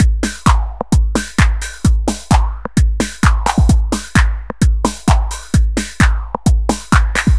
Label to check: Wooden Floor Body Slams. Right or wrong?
Wrong